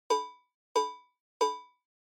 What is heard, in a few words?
Cowbell Synth